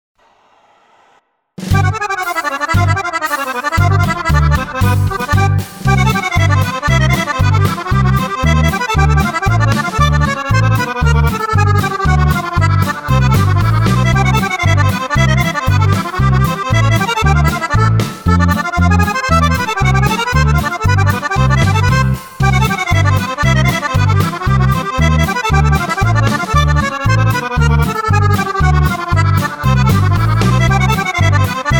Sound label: polka